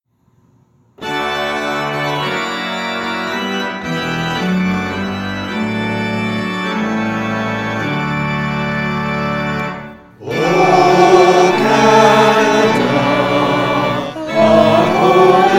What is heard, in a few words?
O Canada Virtual Choir